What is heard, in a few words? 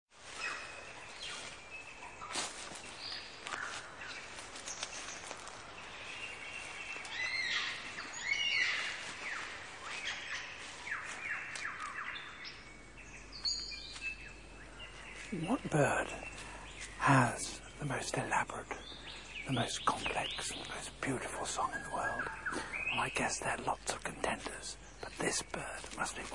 Amazing Bird sounds from the lyre bird; David Attenborough; BBC wildlife